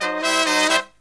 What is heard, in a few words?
Killer Brass Riffs